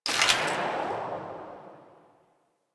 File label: Merchant UI Close; Warframe; Veilbreaker